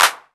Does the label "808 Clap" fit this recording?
Yes